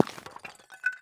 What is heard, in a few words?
ceramic dug; everness